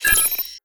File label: table